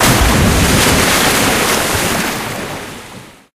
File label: crocs jump in; Source Engine; tf; lair